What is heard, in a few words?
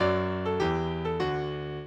minuet, mozart